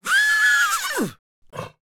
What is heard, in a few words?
actor performing as horse alone; Sims 3 Pet sound effects